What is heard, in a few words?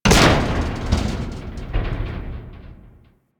Ship Hull Impact Medium; Hit Effects; Warframe; Railjack Hull Hit Effects